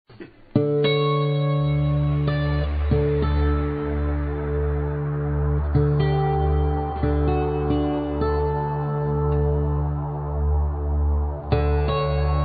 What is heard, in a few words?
Horn synths